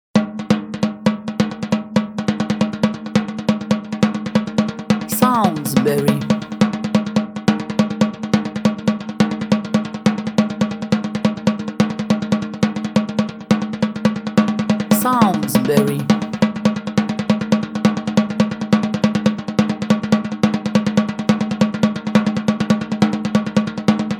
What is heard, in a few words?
Nervousness